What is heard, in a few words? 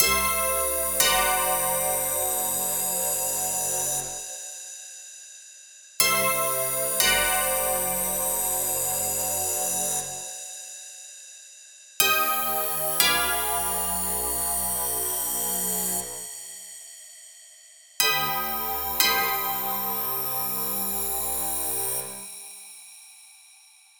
Horror Theme Loop